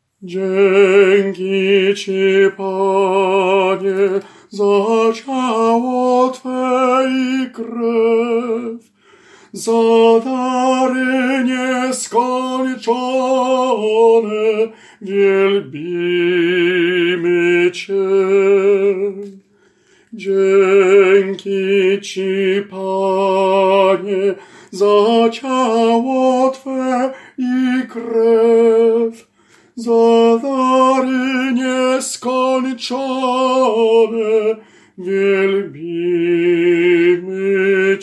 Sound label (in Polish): TENOR; Dzieki Ci Panie